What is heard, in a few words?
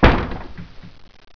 button